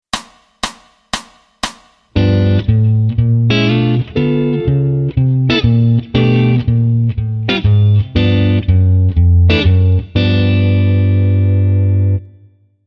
G7 Walk Plus 4ths Voicings fast

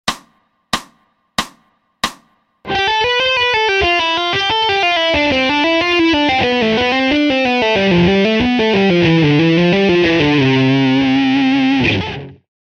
Tapped Scalar Seq slow

